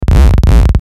hit bass fuzz; HITS

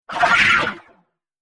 Alien Laser Magic; Alien Sound Effects; Sci-Fi Sound Effect; Andrew Fleming

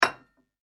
household cup placed on saucer; China Cup Placed On Saucer; Kitchen (Sound Effects:Household); McKinney Sound

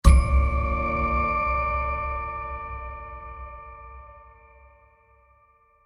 Sci Fi Impact With Mysterious Laser; Science Fiction Spaceship Transition Short; Science Fiction Sound Effects

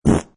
Cartoon Fart Firm, Powerful, and to the point.; Comedy/Cartoon; Funny Sounds; LynneMusic

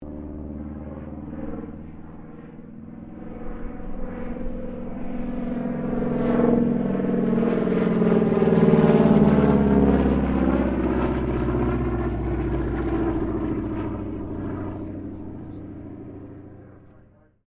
AIRCRAFT CAC CA-25 WINJEEL DIVE PASS; RAAF Winjeel fighter trainer; Airplanes

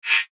FLASH ELEMENT HIGH TECH

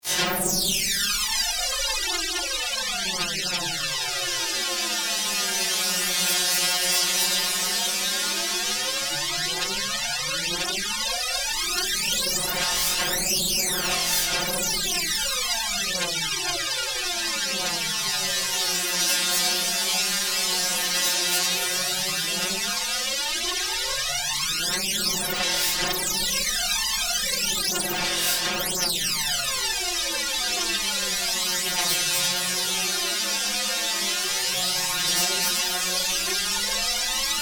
Science Fiction Scanner Drone Hard Flange; Tech

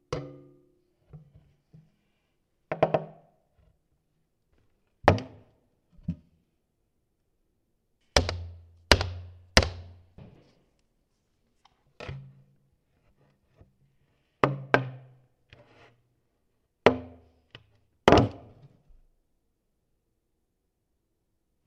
Paint tin lid prized off and tapped; Lids; Objects

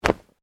Clothing Shirt Cotton Flap Sharp; Movemement sounds for various articles of clothing; Dressing

